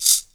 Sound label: SHAKER, Drums; Big Fish Audio; Ross Garfield Drums VOL-2